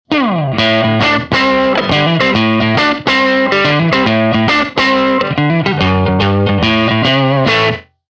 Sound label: Blues; elements